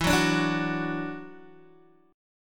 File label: E 7th Suspended 2nd, down, Guitar, DADGAD; E7sus2; strummed